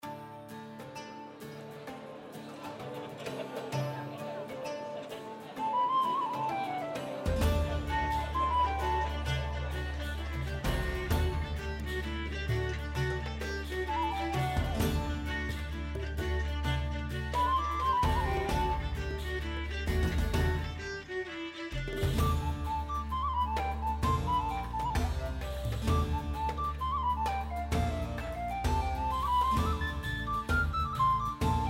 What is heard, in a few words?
Scene Pub